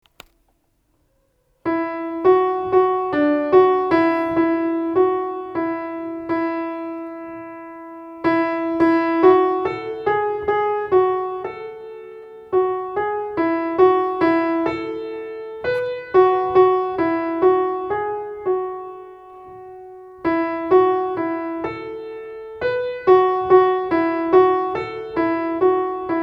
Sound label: vidiaqam pno